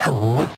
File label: Swing; Joblin Joblifter